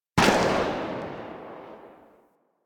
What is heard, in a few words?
Rifle Tail, Weapons; Drake